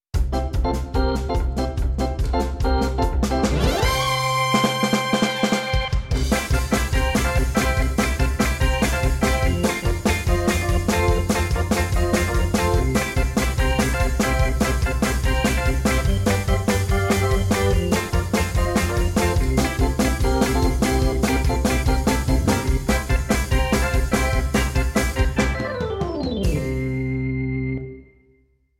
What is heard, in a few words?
BED GIDEON ORGAN FAST, Soundtrack; Gravity Falls Season 1 - Soundtrack Excerpts Vol. 3